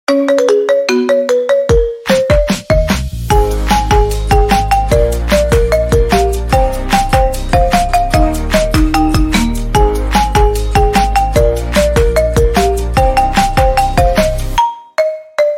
marimba; iPhone

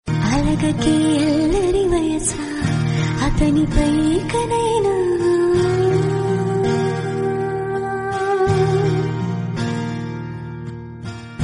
telugu romantic

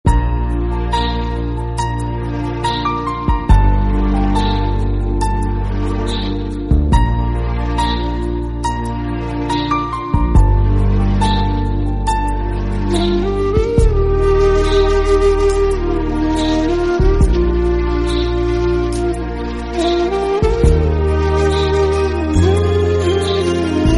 pleasant instrumental, ringtone

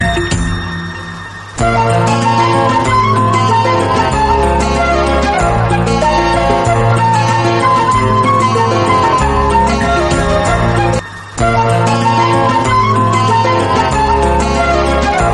flute, ringtone

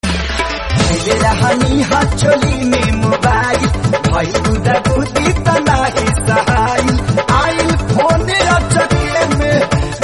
bhojpuri, ringtone

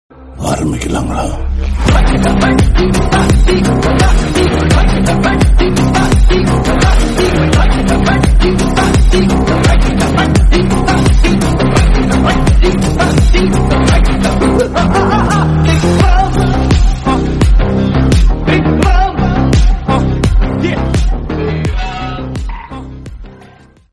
tamil mass bgm

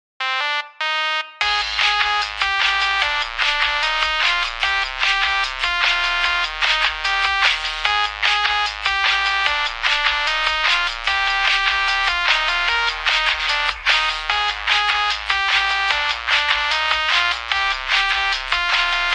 Nokia ringtone

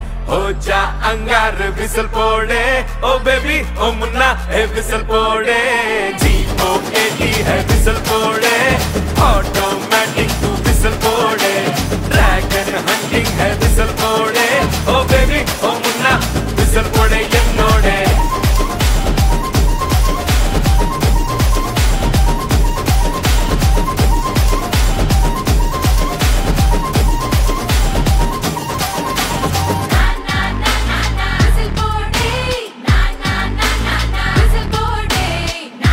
whistle

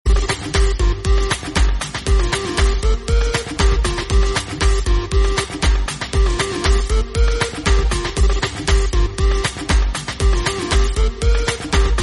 flute

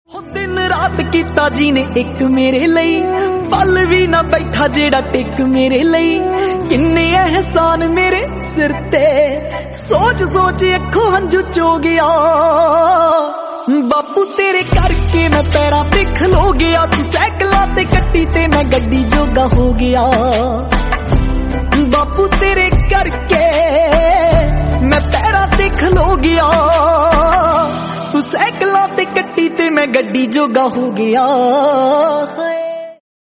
sad punjabi song

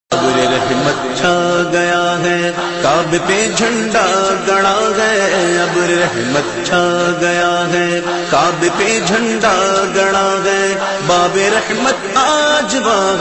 islamic

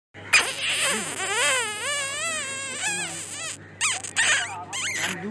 zip sounds

